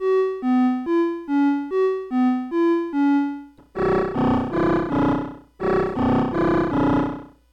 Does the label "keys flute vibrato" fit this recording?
Yes